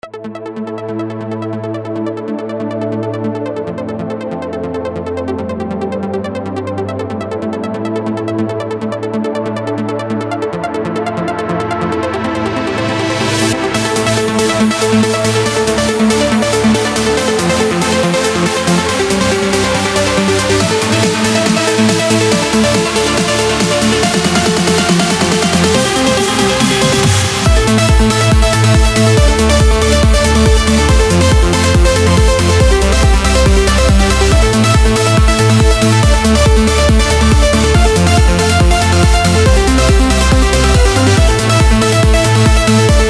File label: edm sidechainer